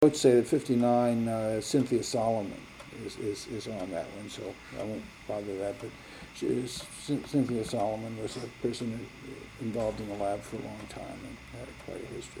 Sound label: bongo